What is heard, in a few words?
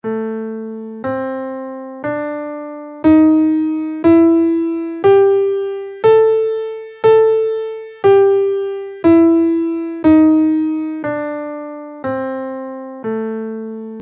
blues scale in Am